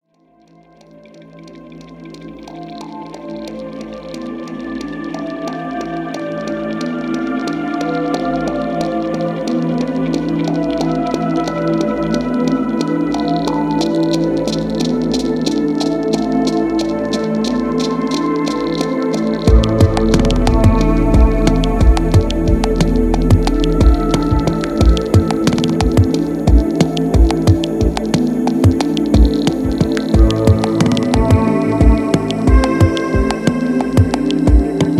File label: Interior Music; Grav